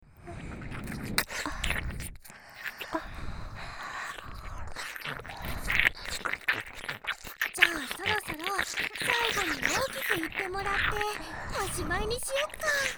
耳舐め絶頂 エンドレス終了パート; 迷い子の催眠

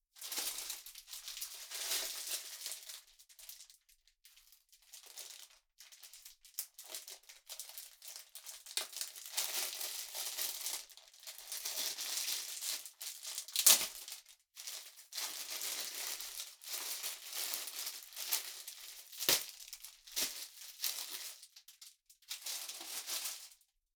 食材の袋を開ける; 3DIO; 食事に関する音

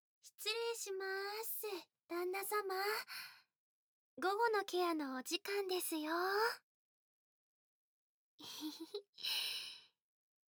耳舐め足コキ（効果音なし）; 専属ナースゆい; 癒し系専属ナースのゆいちゃんと菜々子ママに甘々&バブみで包まれちゃうバイノーラル

